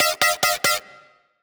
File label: Terror Bass E, Dubstep, Synths; One Shots; Cymatics; Dubstep Starter Pack